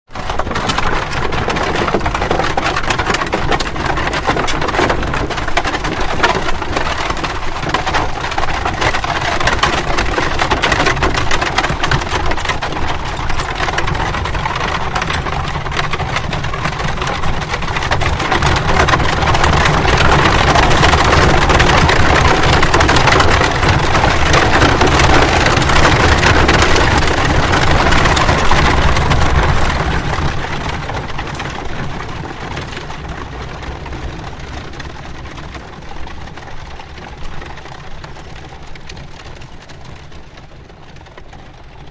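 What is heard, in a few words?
Carriage Starts Accelerate, carriages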